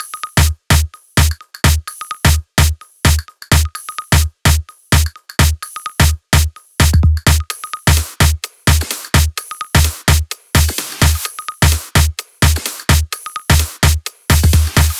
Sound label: Dirty Dutch Kit Drums; Mutekki Media; Vengeance Electroshock Vol.2; Dirty Dutch Kit 1